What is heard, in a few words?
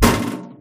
metal computer impact soft; physics